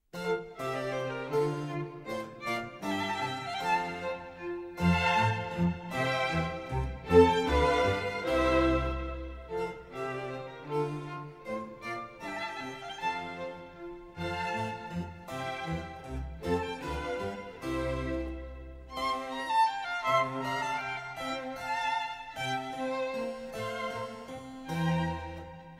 menuet; corelli arcangelo

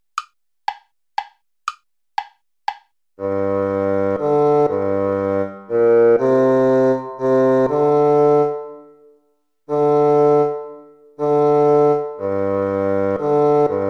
baroque minuet minor play along

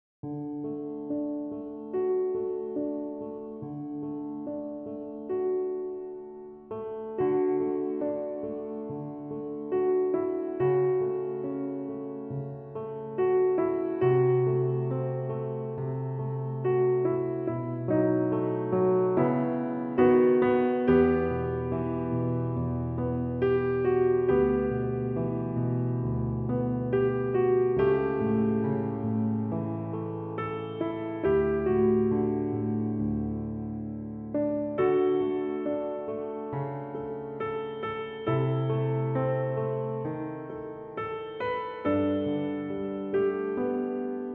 piano sonata

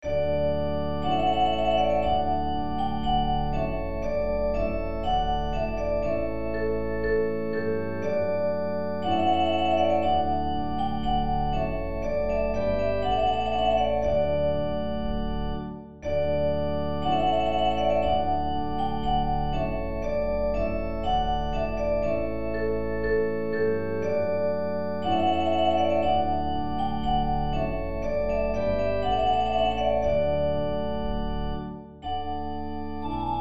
trumpet voluntary; clarke jeremiah

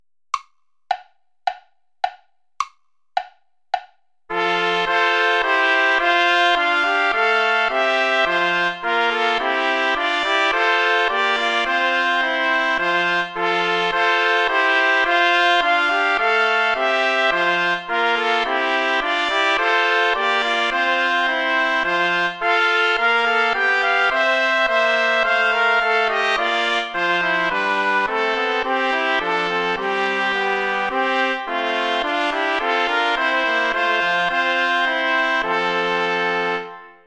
play along without trumpet; bach johann sebastian; was gott tut das ist wohlgetan

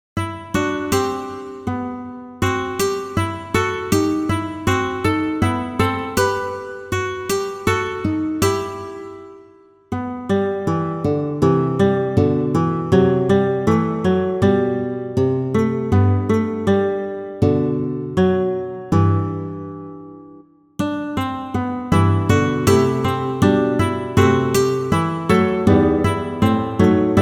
contrapontic study; avec tablature

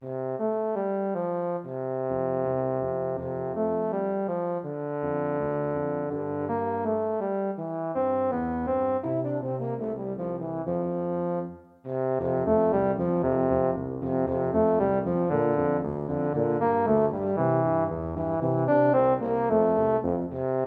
jingle bells; pierpont james; the one horse open sleigh

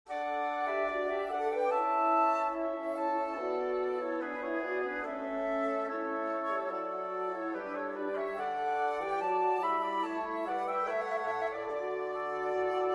marche triomphante, for wind quartet; Charpentier Marc-Antoine

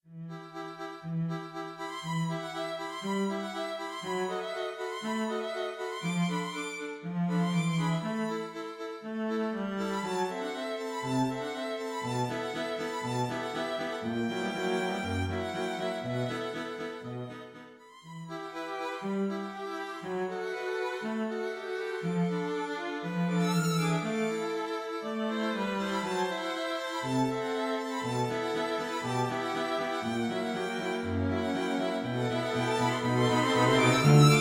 for string quartet

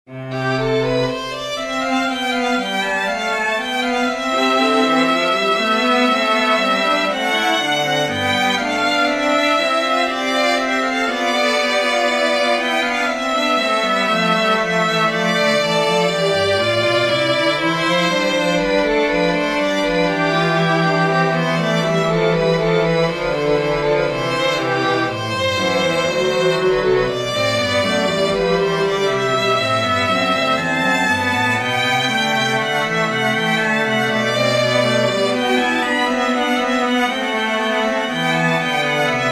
sinfonia c major for string trio; Johann Sebastian Bach

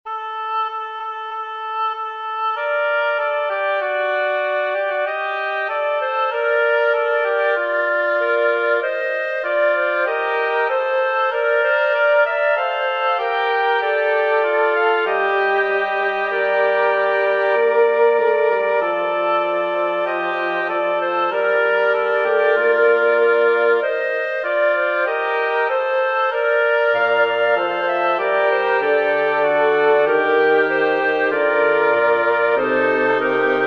chant des oiseaux for woodwind quartet; janequin clement

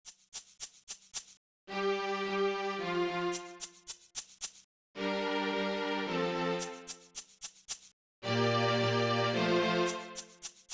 igbo folk music; nwa nza the bird

